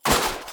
Bow; nox

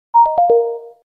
join; kwin

